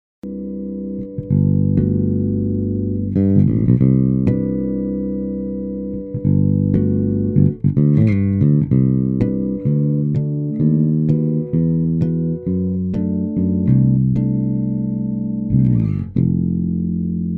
Harmonics; 4 Stroke

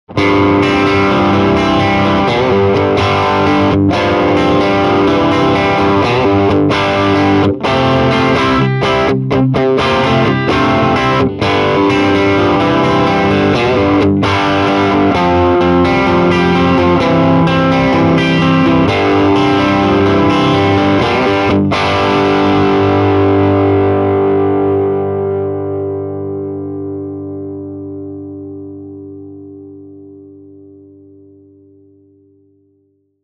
Rocker Tele Classic Rock

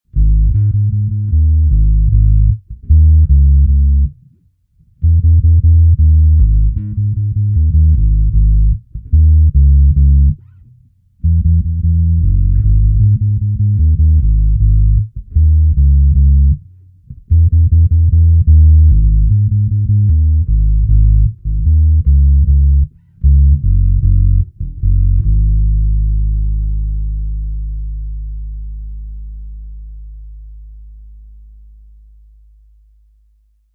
Bass Dub Sub; Bass Butler

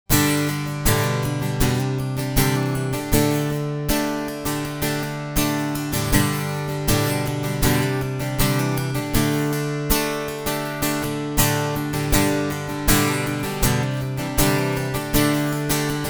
Acoustic Pedal Strummed

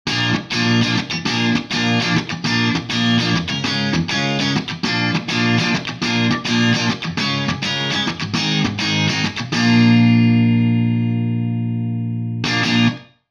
Micro Dark Clean Indie Chords

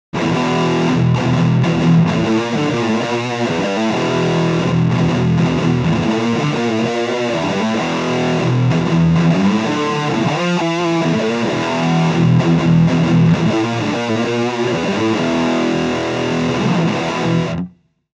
Crush Heavy Rhythm Double Track

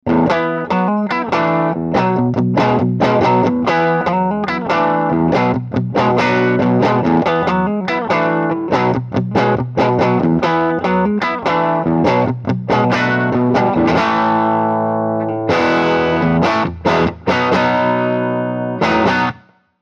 Rocker 15 Dirty; Orange

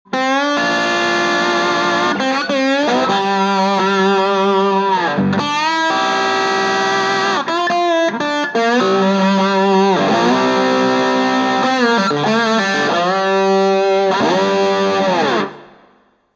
Crush 20RT Slide Reverb

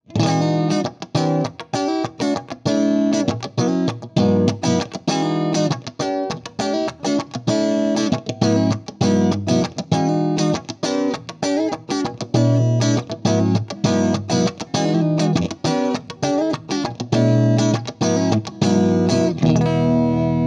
Clean Funky

